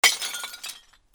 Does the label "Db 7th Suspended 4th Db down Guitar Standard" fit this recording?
No